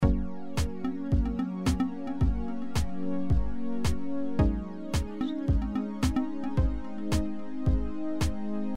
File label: magic Hint